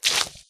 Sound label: car puddle splash; lightning speed